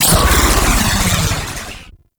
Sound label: Tornado Cast Impact; Warframe; Sentients; Sentient Trooper